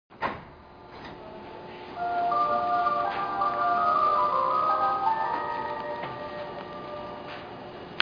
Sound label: Melody, meitetsu